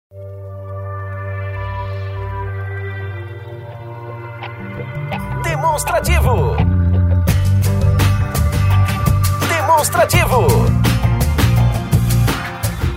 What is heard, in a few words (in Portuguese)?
tema espera